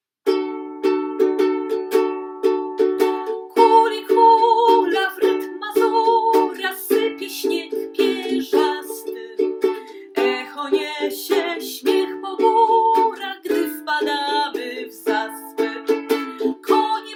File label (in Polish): mazur D dur spiew